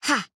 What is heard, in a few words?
Attack Small; Arya